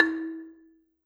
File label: Gambang Hard Beater, Gamelan